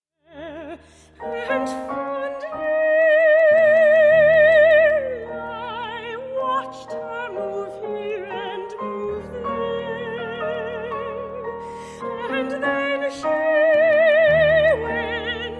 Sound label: voice and piano; She Moved Thro the Fair; trad arr